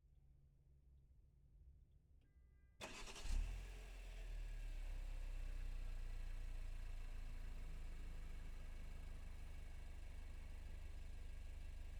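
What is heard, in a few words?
Toyota CHR Turbo Exterior Start Idle Blips Off; Holophone; Toyota C-HR Turbo 2017; Pole Position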